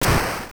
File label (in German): gegnertot; Ninja Gaiden; gamesoundopedia